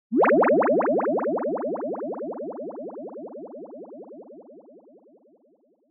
Motion Pop

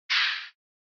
Soccer Slide Tackle; SNES

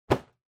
Balloon, Catch

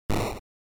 General Noise Single

